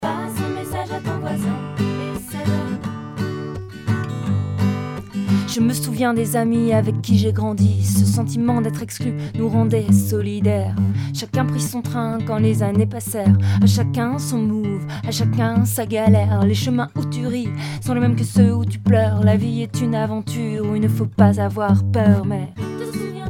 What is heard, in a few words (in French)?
solo